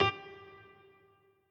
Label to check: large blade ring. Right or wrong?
Wrong